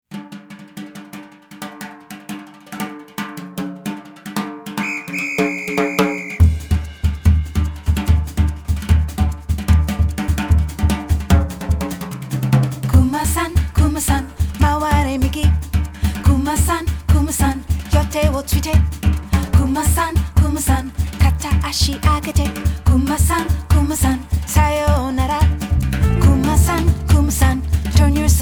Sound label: Samba; Kuma SAN Book 1